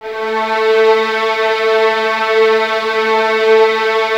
VS A 3, violins, string ensembles; Miroslav Vitous; 11 Violins

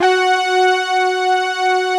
Obie Stack, OBX Filter; Optical Media International; Sonic Images Library

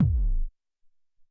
House Kik, Alesis SR-18

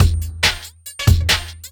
Funky Beat, Drum N Bass

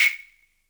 wood, Univox SR-95

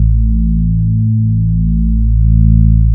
A1, BASSES

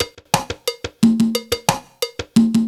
Conga Standard; Drums

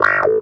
Clavinet; Ambient Grooves

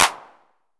909 CLAP, HOUSE KIT; Drums In Your FACE!

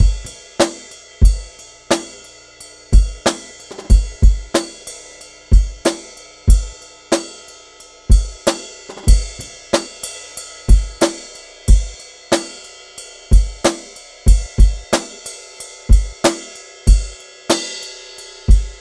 Drum Kit, Electro Lounge; Sonic Foundry (Sony Creative Software)

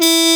muted, SC55 brass

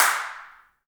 handclaps, Heart of Africa

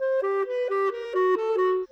Clarinet; Sonic Foundry (Sony Creative Software); Orchestral VOL-2; Modern 27

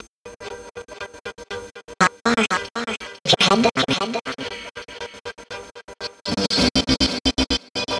Envelope Beat; Beats; Drums & Drones

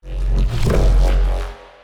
neuro, bass

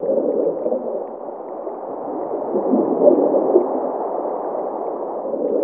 SUB-AQUA, SUB AQUEOUS; Zero-G Ambient 1

